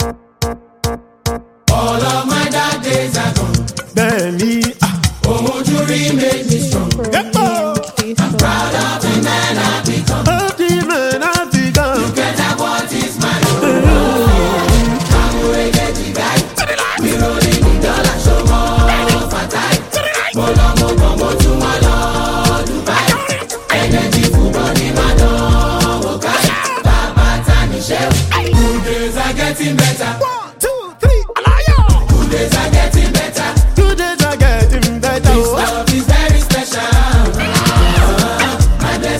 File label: loud urban choir